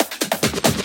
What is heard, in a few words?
Fills, Loops 140 BPM